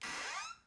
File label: door creak fast; Five Nights at Freddy's; FNAF 4